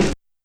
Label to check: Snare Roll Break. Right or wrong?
Right